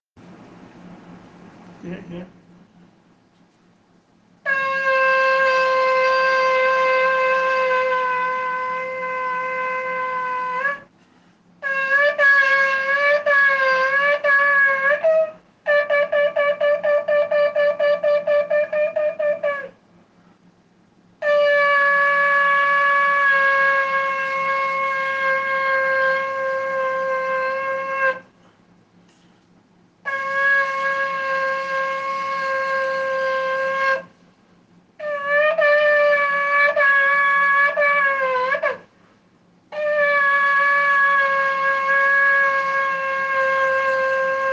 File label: shofar